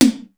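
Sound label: high super tom; sr16 tom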